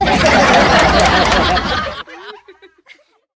crowd laugh

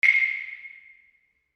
claves single note

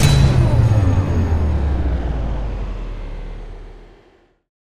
Bass Drop

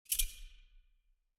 retro sword click; soundscrate

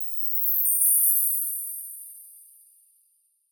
fairy dust

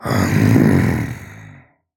growl

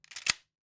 Shotgun Loading; SoundsCrate

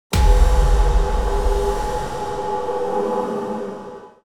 designer magical hit

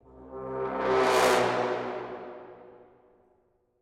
low brass flutter; soundscrate

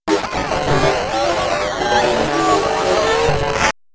robotic glitch

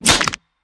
monster chomp; soundscrate

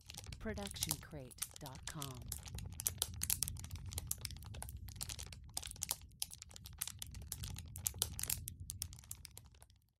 Outdoor Small Fire; SoundsCrate

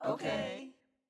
audience "ok"

